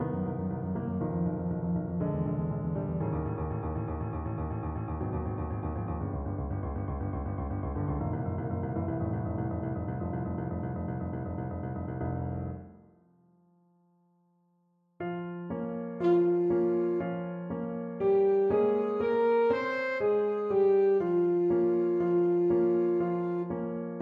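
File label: alto saxophone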